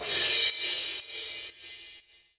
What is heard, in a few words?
Crash; Equipped Music; Loungin House